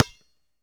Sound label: Street Ball Bounce Concrete